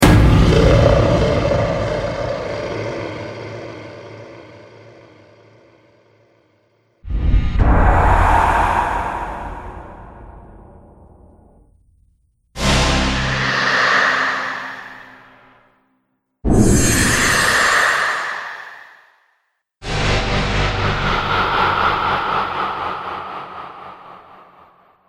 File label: Demonic Voice Impact Collection, Monster Sound Effects